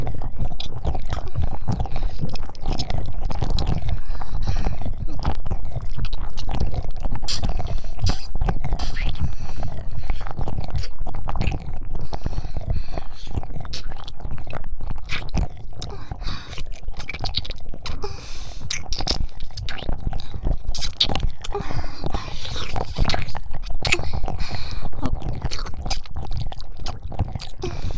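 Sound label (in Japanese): 耳舐め+効果音のみ（バイノーラルマイク）, ASMR; オマケ効果音のみロング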